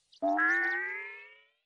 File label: Toinggg Ringtone